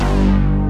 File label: LAYER BASS, ENSONIQ VFX; Rubber Chicken Software Sample Bank